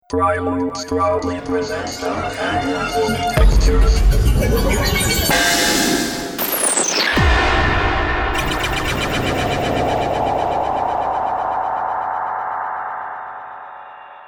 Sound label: Dark Ambiences and Textures